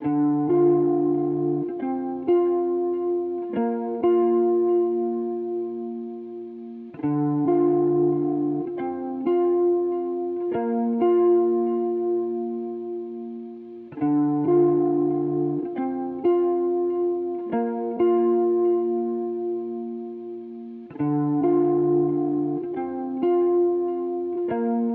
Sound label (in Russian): zatsiklennye melodii dlia gitary loop